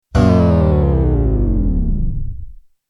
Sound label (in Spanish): Sonido Incorrecto